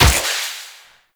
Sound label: Wall Run Step; Reaper; Warframe; Wraith